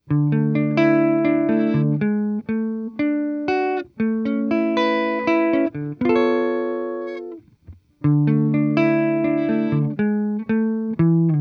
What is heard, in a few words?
Chorus Example